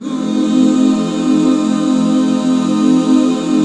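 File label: HAL F 7 right, Back Chords; Sound & Vision - Gigapack II CD 1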